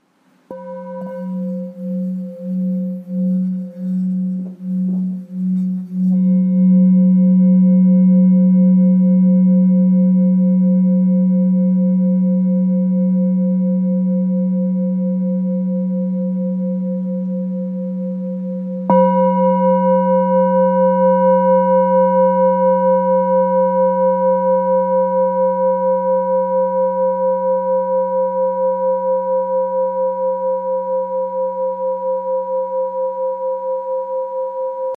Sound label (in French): Bol bengale 900g-1kg Do